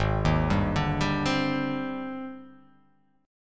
G sharp Major Sharp 11th; 4,6,5,7,7,7,x,x

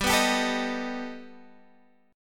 B Augmented 7th G

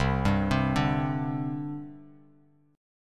C Suspended 2nd, C, down, Bass Standard; Csus2 arpeggiated

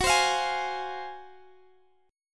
F Minor F sharp, chord; strummed

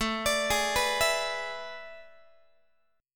A Major 7th Suspended 2nd Suspended 4th, Guitar; AM7sus2sus4 arpeggiated; x,17,17,18,15,15; JGuitar

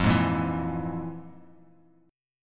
F Minor Major 7th Double Flat 5th, Bass Standard; FmM7bb5 strummed; JGuitar

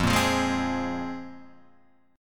C 7th Suspended 4th Sharp 5th

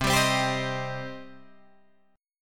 C Minor C down Guitar Drop D

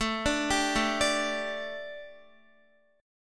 A 7th Suspended 4th Guitar, chord; A7sus4 arpeggiated

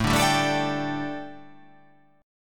A 7th Suspended 4th, A, down

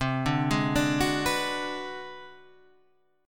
G sharp 7th Sharp 9th Flat 5th

